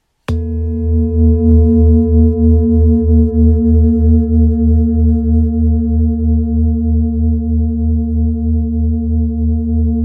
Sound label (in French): Bol chantant full moon mi